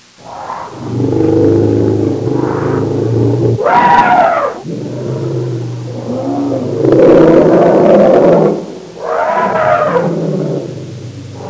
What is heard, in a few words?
african jungle; jungle animals; Sound Effects for Multimedia - Volume 1